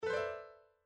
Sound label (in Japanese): グリス 下から上